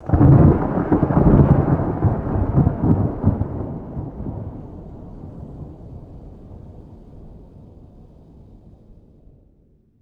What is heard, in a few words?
low distant thunder rumble fading out; weather